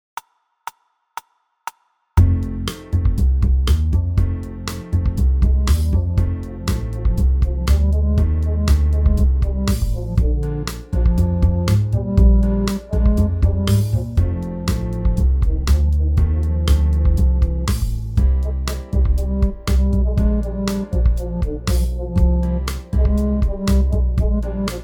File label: MIDI Blues; spellman studio